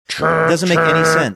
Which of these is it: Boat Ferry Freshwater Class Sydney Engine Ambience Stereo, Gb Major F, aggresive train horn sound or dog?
aggresive train horn sound